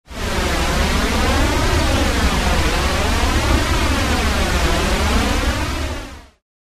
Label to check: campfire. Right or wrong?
Wrong